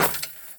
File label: Street Ball Chain Swish